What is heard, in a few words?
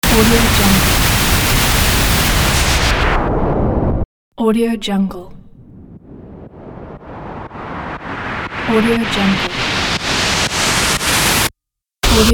the white noise